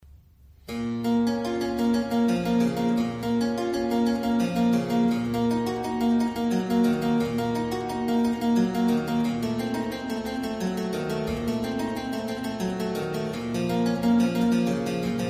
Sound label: kleine Praludien in C minor; BWV999; Johann Sebastian Bach